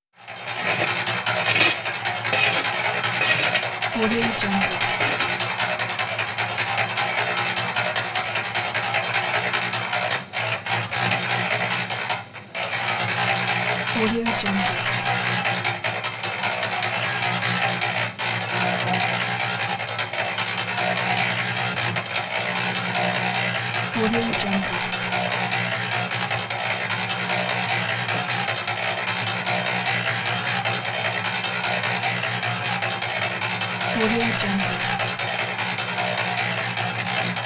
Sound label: concrete mixing and shoveling